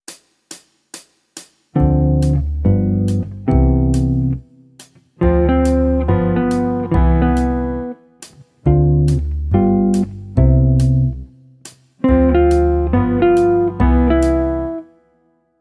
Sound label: Jazz, Guitar; Guitar Techniques 2019 Issue 294 Spring